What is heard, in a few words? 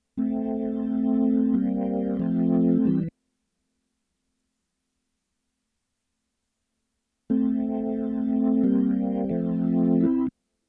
Keys